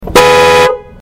Mondeo Horn Outside

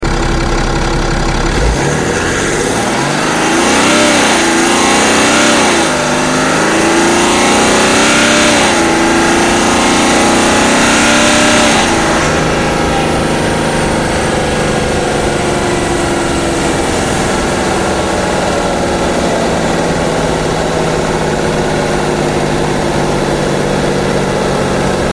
X5 xDrive30d Acceleration Outside

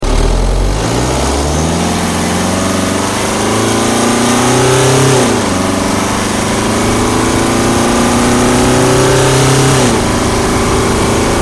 320d Sports Hard Acceleration Outside

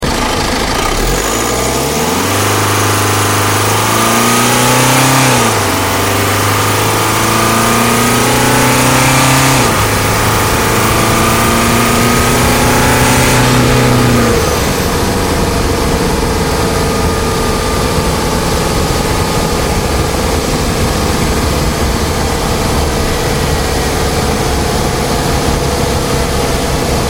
X1 Hard Acceleration Outside

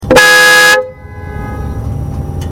Mini Coupe Horn

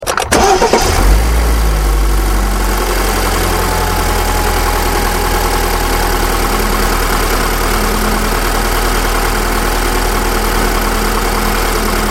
X3 Ignition Outside

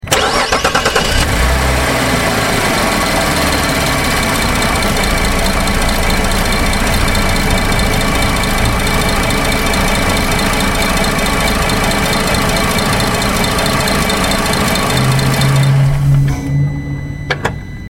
Holden Commodore SV6 Ignition Outside

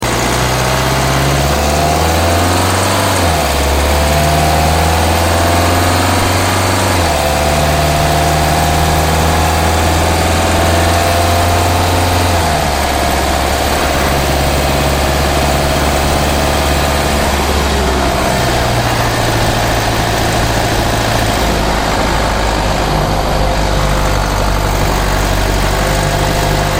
X3 20d Normal Acceleration Outside

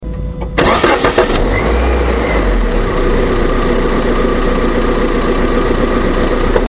Persona Ignition Outside

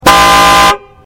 Mini Countryman Horn Outside

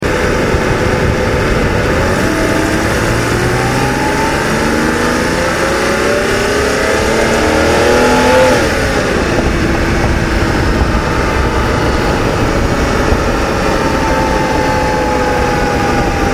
BMW 523i Acceleration Outside

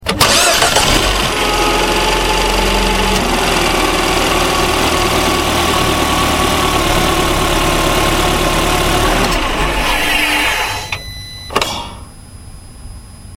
Mini Cooper Cold Start with Aircondition Outside

